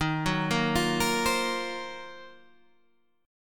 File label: Eb Minor 6th Add 9th Eb down Guitar Standard; arpeggiated